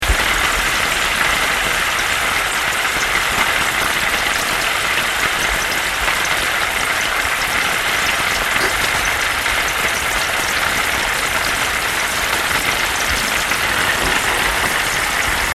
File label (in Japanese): abura